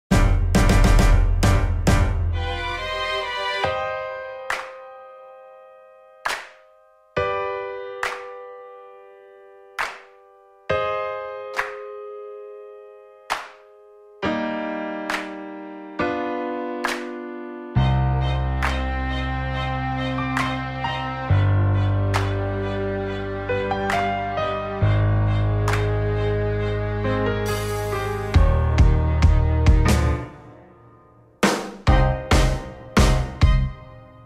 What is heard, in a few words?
karaoke instrumental